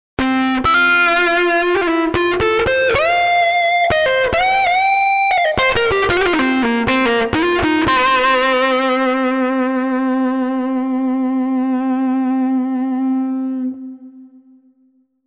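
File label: Tube Guitar; TURBO VL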